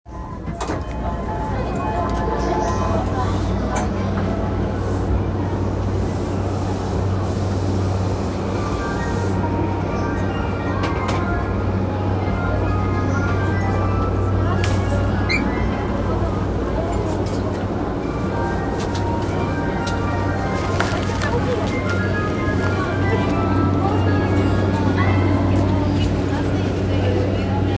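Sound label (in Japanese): 山手線 品川 clockwise, train sounds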